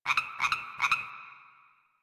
Drifter Camp Water Creature, Creatures, Ambience; One Offs; Warframe; Quests; The New War

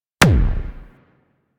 Arch Gun Primary Beam Punch; Warframe; Archwing; Hildryn

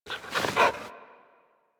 idle sit scratch pant, Kubrow; Enemies; Warframe; Tame

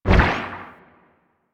Dash Bubble Start; Powers; Warframe; Focus; Operators

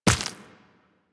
Step Flesh, Footsteps, Walk; Warframe; Tenno

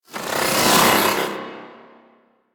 Bow Draw; Warframe; The New War; Quests; Weapons; Omicrus

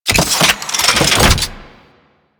Rolling Drone Turret Spawn

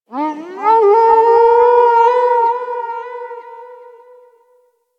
Lure Call Success; Warframe; Hunting Critters; Legendary Kubrow

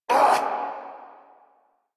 Pain Lich A

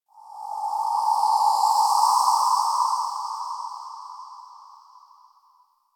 Ext Wind Dissonnant Death Howl; Ambience; Misc Outdoor; Warframe; Infested Eris

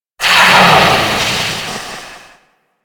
Generic Death, Vocals; Warframe; Orokin

